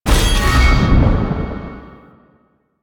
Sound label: Void Dragon Glaive Pickup; Enemies; Teshin; Warframe; Duviri